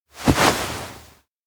sofa getup lookaround; anemoi

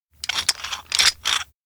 jack move updown rubble; anemoi

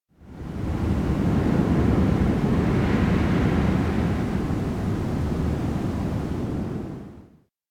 seabreeze strong; anemoi

